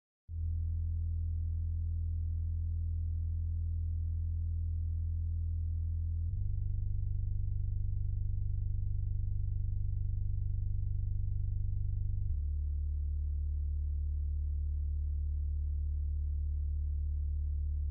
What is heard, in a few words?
Police